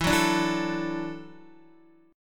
E 7th Suspended 2nd Suspended 4th E down Guitar 4E 4A 5D 5G 5C 6F; E7sus2sus4; strummed